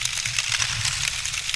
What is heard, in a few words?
ICE CUBE